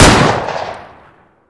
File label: 357 fire, weapons; hl2dm